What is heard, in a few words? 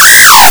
hive; full metal democracy gamerip